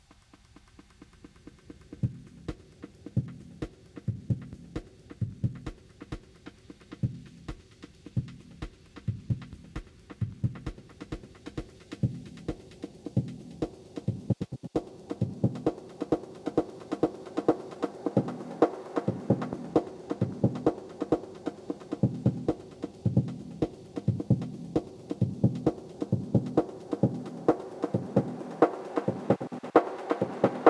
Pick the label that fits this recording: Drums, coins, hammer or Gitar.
Drums